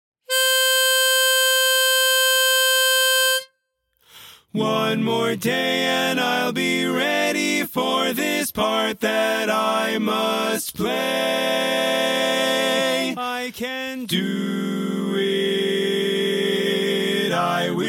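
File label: Baritone, barbershop tags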